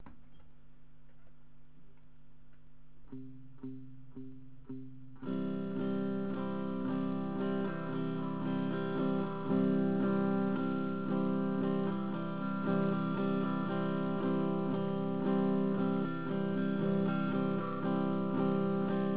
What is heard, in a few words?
strumming